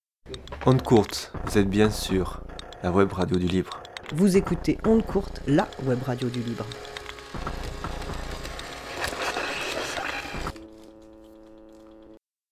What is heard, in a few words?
jingle; Ondecourte